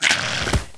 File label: mongoos dead, monster